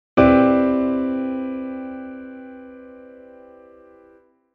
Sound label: piano